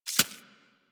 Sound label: Arachnoid Micro Step Snow; Warframe; Corpus